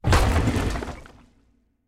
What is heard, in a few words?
Charge bump; Super Mombo Quest